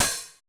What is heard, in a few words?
cymbal, Roland MC-909